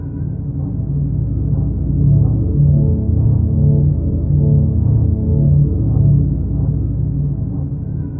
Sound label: citadel drone loop; Half Life Model Pack; Half Life 2; ambient levels citadel